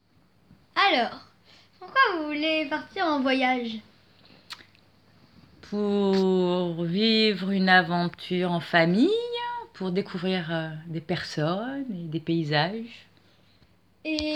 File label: Vocal